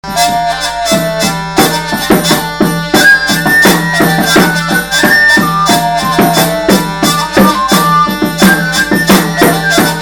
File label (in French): marchands Instrumental